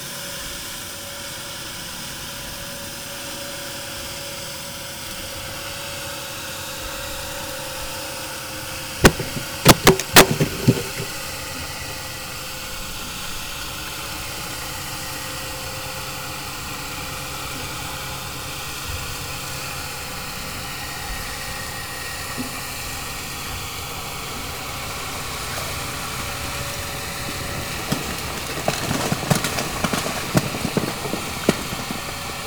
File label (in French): chute rocher pelleteuse; NORTEK